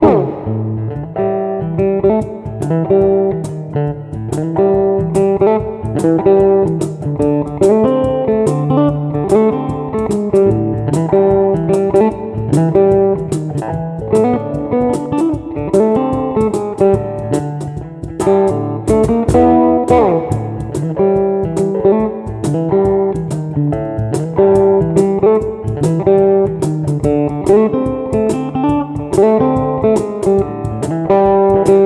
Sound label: Short Blues Loop